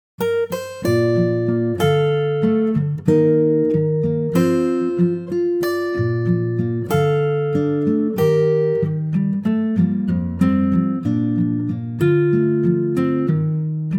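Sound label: Bb to C Acoustic Guitar; key - Bb to C - vocal range - F to D (optional G falsetto after the bridge)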